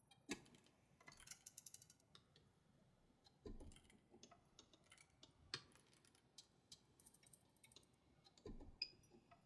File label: idle, lockpick